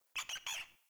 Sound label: Animal Rescue Trapped Hare Call; Encounters